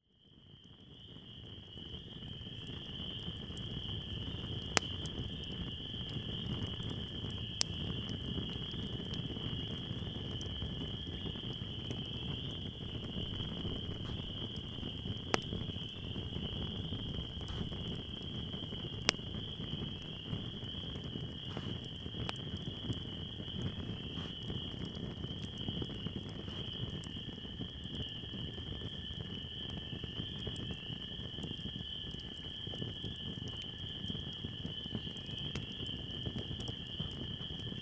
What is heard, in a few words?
Cracklin' Campfire; Wisconsin 2019